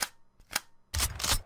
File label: reload Shotgun